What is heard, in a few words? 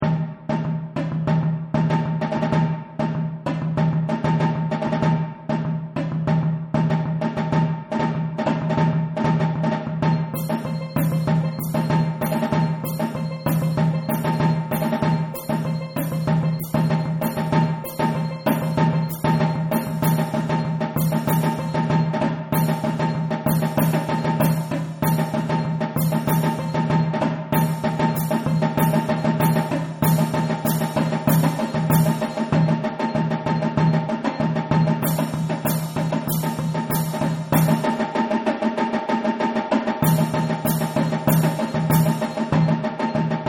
funky rhythm